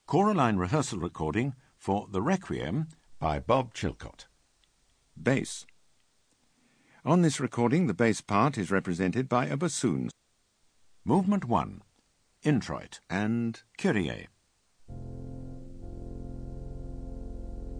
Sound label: Bass; Chilcott Requiem